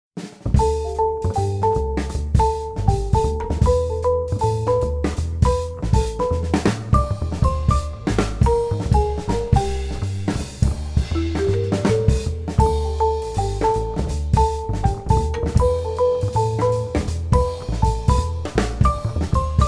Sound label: Jazz , Steel Band; Wedding DJ